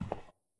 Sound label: footstep; Ludum Dare 46; Random footsteps